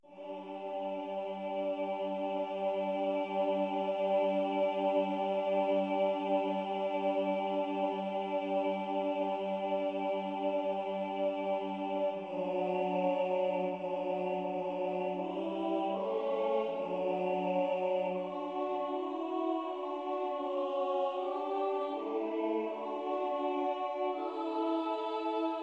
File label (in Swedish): sop alt; Härlig är jorden; valborg 2018